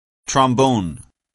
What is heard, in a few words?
trombone